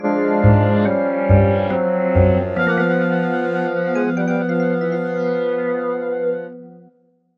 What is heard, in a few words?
Fail; sim theme park theme park world 1999 pc gamerip